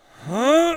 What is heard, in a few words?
grunt Work, working People